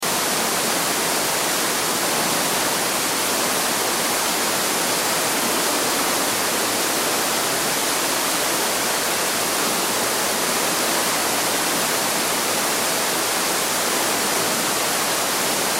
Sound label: Cave Underground, Water Cave Underground Waterfall Ambience Nature Loop; River; Rivers; Blastwave